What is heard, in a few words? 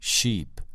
sheep